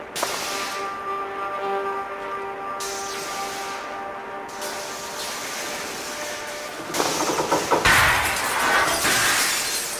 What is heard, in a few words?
electricity destruction; limbo